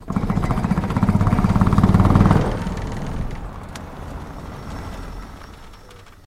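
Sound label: Tire On Crossing Grate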